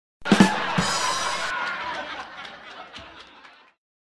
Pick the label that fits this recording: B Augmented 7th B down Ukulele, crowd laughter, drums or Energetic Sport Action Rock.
crowd laughter